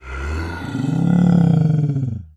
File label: Animal Mammal Carnivore Cat Spotted Leopard Growl; animals; AdobeCC